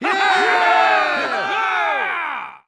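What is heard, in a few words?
cheer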